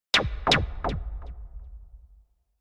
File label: Laser Dart, Futuristic Laser Pulse.; Lasers